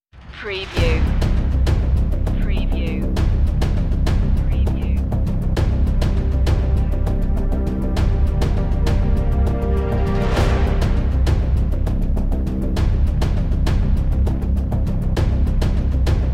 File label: bad decade no strings